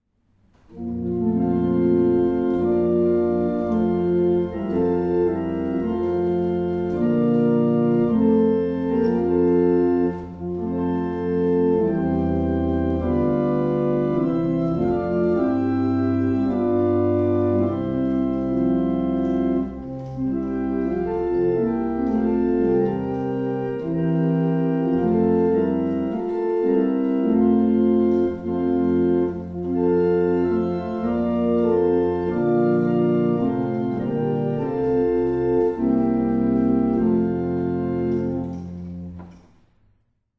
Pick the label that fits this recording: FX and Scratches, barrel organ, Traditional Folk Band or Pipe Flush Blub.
barrel organ